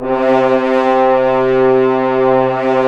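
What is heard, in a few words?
french horns, swells; Roland L-CDX-03 Disk 2; F.Horns 1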